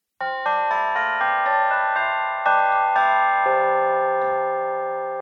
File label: Chimeatron